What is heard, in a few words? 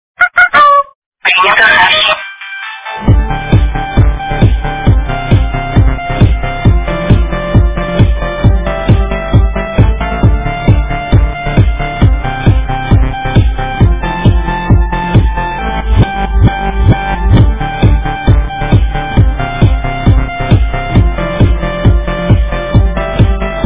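Klubnoe SMS, club SMS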